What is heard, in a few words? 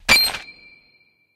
Ticket Impact; Angry Birds